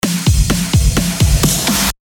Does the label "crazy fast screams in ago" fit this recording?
No